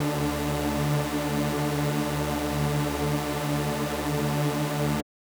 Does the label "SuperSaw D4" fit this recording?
Yes